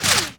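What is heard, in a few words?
Bullet left to right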